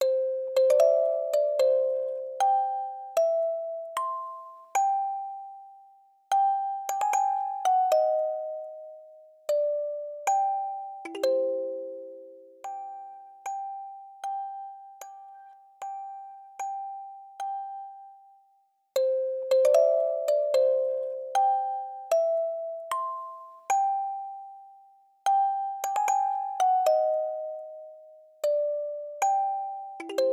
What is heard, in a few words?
Music Box